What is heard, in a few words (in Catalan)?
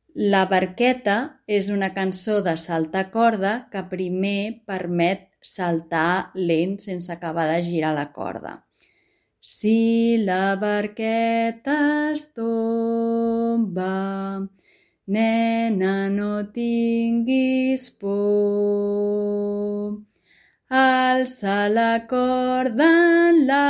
saltar a corda; La barqueta; jocs i cançons de carrer